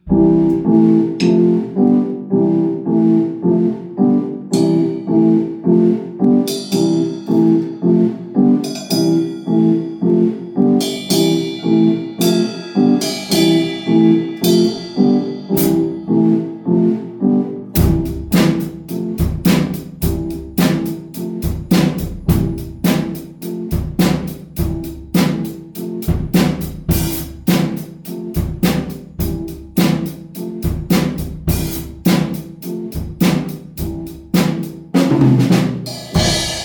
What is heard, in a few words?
jam